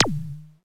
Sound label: zap Q bobble; E-MU Formula 4000 Series Vol. 2; Dance Hits